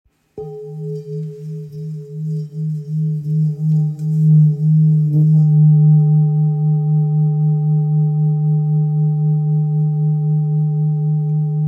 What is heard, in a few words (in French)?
Bol ramgandi sol diese